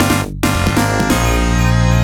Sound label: Jingle - 1-Up; Stage Clear From Shinobi Iii - Return Of The Ninja Master; Metal Sonic Hyperdrive; genesis